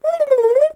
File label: turkey exclaim